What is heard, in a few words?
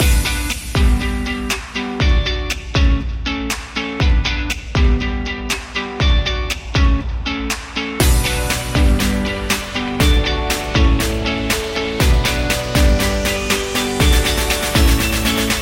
Pop Guitar